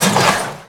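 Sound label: suspension, car; Cars; XBOX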